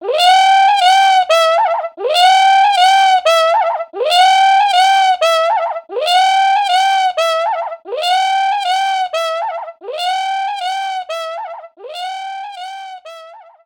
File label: Music Bayou Hungry frogs Flourish; The Princess and the Frog; wii windows